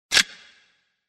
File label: unlock